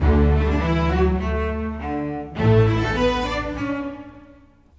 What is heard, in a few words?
Bass, Cello, Viola, Orchestral, Rock Pop; Sonic Foundry (Sony Creative Software); Orchestral Series VOL-4 - Rock and Pop